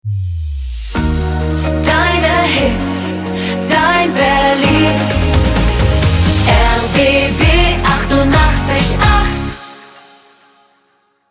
Transition Up

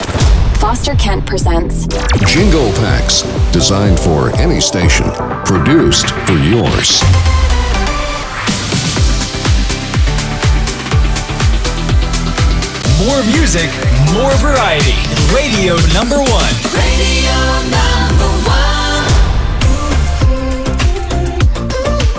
On Air; JinglePACKS

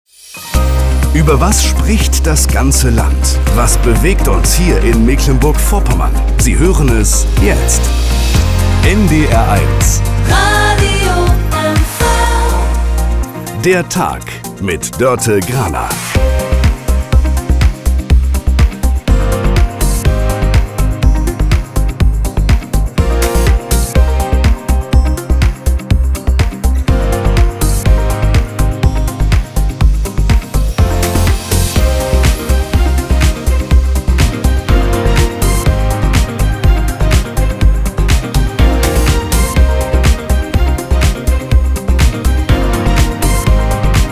Daytime Opener; NDR 1 Radio MV

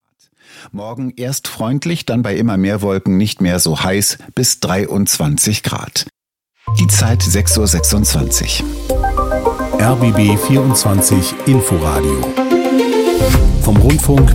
Opener

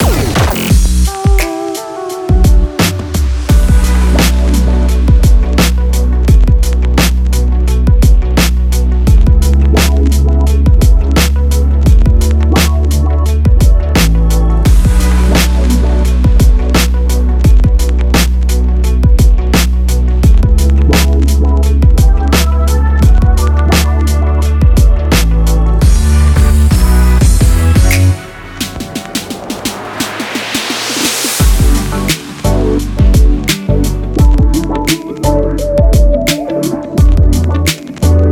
Theme Relaxed; Radio Hamburg

